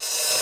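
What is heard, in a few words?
OPEN HI HAT REVERSE; Hollywood Edge; The Big Bad Beatmachine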